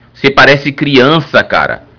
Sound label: berimbau